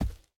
Step Enemy; MiSide

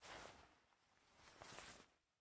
Take Ring; MiSide

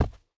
Step Enemy; Game rip; MiSide

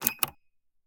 Button Safe; MiSide

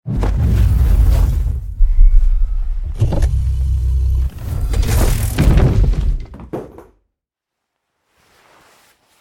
Core Throw; raw game audio files; MitaCore; MiSide

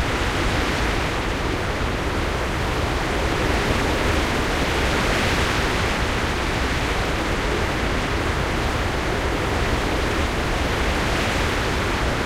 Wind Tube; Game rip; MiSide

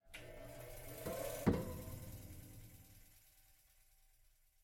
Wire Activation; MiSide

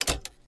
Button Down; Game rip; MiSide

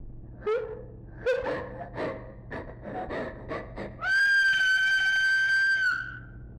Scream; Mita; MiSide

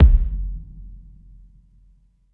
Text Bass; Game rip; MiSide

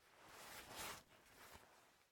Head Bat; Game rip; MiSide

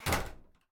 Door Close; Mita; MiSide

